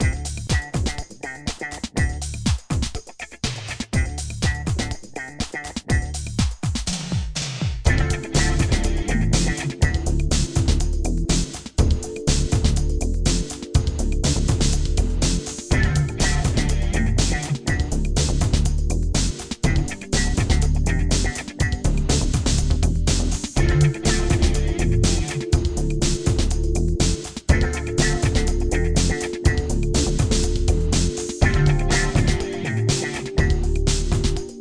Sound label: FUNK; Anstoss 2